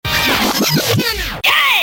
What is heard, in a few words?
Scratch